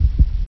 heart pound

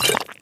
drink

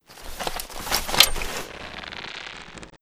bow load

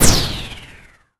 arrow whiz

